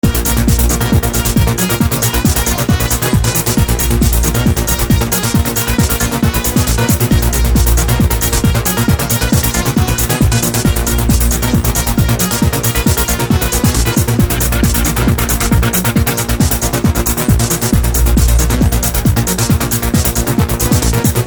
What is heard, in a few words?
goatrance